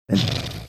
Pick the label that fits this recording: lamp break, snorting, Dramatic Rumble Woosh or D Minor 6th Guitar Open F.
snorting